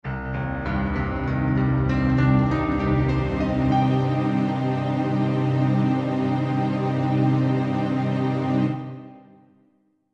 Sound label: Piano Strings Melody Min; Arpeggio; Blastwave